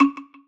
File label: wood hollow; Notes